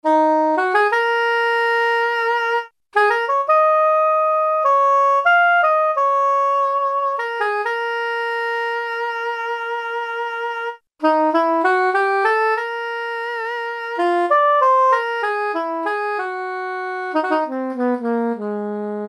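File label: Saxophone Soprano Long; Brass And Woodwinds; Roland L-CDX-03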